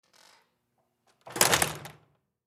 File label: Door Exterior Close Office; Factory Office; Miscellaneous Doors; INDUSTRY; Blastwave